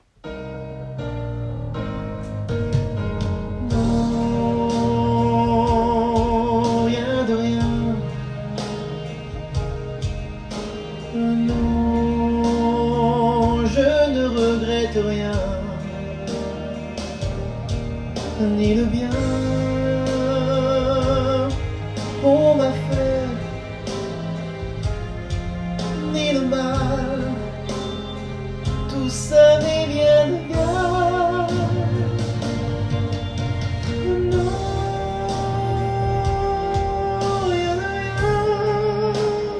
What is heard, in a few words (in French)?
refrain fin